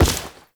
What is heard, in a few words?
Step Default